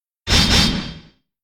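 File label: giant wolf attack; monster; Senillinea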